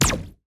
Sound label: Rapid Laser Fire